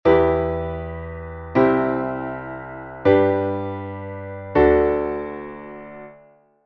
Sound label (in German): Akkordfolge